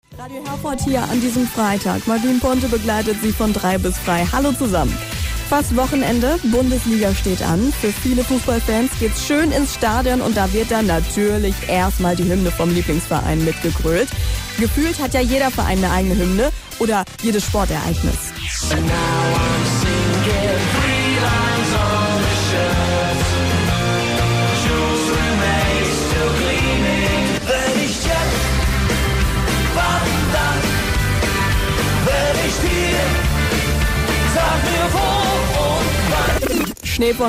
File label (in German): Hymne